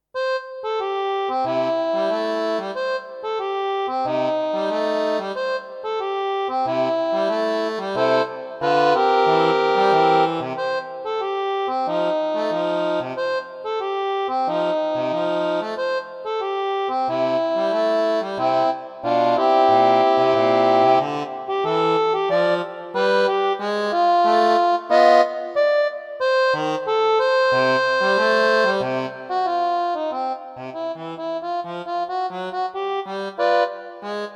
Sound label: Bluesing